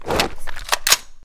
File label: draw, combat; CosmicRage